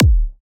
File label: G House Kick D; Drums; One Shots; Kicks; Cymatics; Cymatics - House Starter Pack